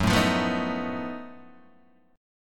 F sharp Minor Major 13th, Guitar DADGAD; chordshape; down

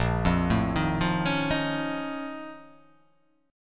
A sharp Minor Major 11th

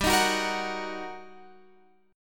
G Minor Major 7th Sharp 5th, down, Guitar, Open F; GmM7#5 strummed; JGuitar

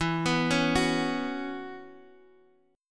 B Suspended 2nd Suspended 4th

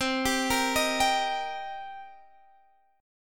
C Minor 7th C; Cm7 arpeggiated; x,15,17,15,16,15

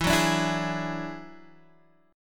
Gb 7th Suspended 2nd Sharp 5th E

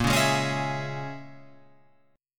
Bb Minor 7th Flat 5th, Guitar, Open F; Bbm7b5 strummed

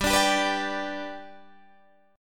G Major, Guitar, Half Step Down

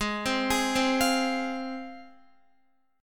Ab 6th Ab, Guitar; x,11,10,13,12,12; Ab6 arpeggiated

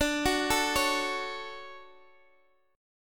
D-Major 7th, D, Guitar, Open G

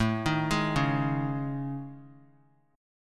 A Major 7th Flat 5th, Guitar, chord; AM7b5 arpeggiated; x,0,3,1,1,x; JGuitar

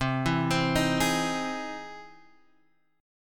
E Augmented Major 7th C

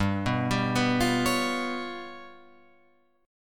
G 7th Flat 5th, down, Guitar, Open F, G7b5 chord; arpeggiated

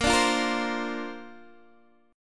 A sharp Major Add 9th, Guitar DADGAD

